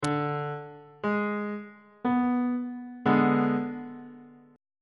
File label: a flat diminished 2nd inversion